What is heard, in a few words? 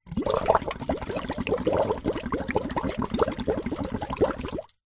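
household water in metal pot bubble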